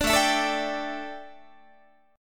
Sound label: A 7th Suspended 4th, A, down, Guitar